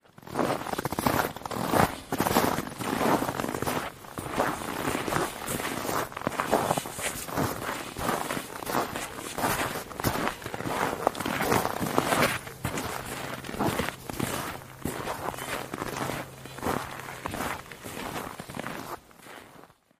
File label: walk in snow, nature